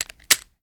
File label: first raise cylinder close; gmod